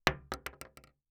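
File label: Weapon Bullet Shell 12 gauge Drop On Hollow Wood; AdobeCC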